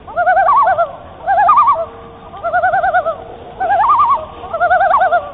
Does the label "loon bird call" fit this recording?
Yes